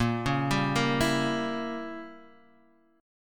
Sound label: A sharp Diminished 7th, chord